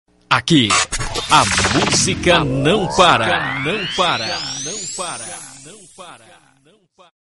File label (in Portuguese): vinheta